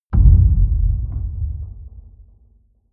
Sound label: boom low impact; hits explosionen